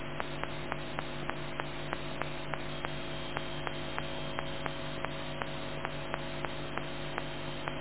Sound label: spontaneous firing